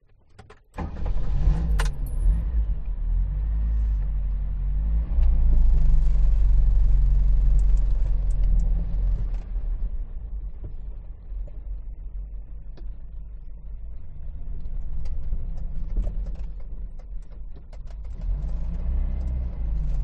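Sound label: interior full ride; detunized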